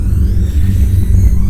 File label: dragon breath windup; Stellaris; guardians